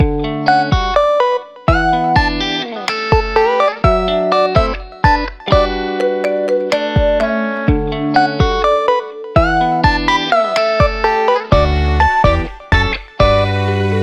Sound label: loop